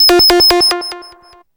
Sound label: ALARM, SCI FI ALARMS; Zero-G; Ambient 1